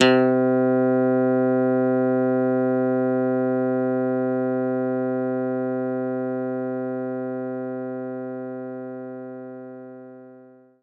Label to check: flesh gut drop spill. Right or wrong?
Wrong